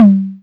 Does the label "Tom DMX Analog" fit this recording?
Yes